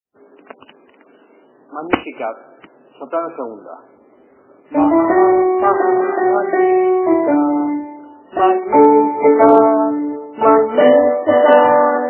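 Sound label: soprano 2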